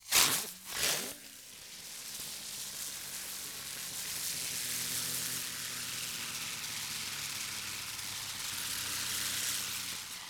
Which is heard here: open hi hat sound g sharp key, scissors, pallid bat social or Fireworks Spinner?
Fireworks Spinner